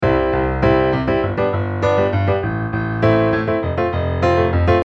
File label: shake beat